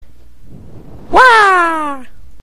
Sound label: uaah